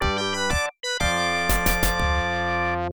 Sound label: Baseball Fanfare; Roger Clemens' MVP Baseball; Genesis